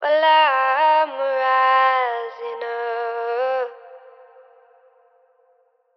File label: Phrase Wet - Rising Up - 75 BPM F Min; Vocals; Cymatics - Eternity Sample Pack; Phrases